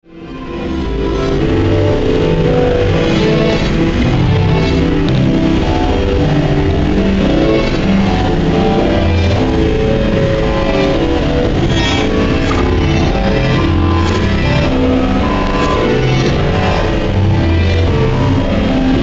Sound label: Drone Musical Eerie Warble Reverse; Musical Sound Design; Blastwave